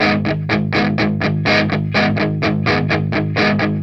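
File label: 125, Vintage Blues Guitar rhythm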